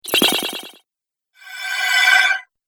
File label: Magic Spells; Magic Sound Effects